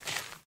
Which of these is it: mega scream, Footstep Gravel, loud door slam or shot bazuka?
Footstep Gravel